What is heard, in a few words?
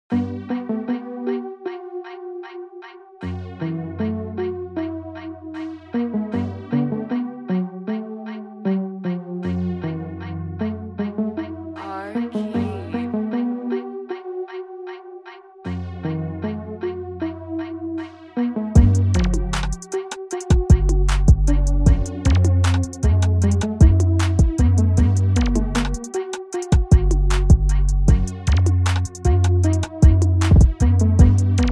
Instrumental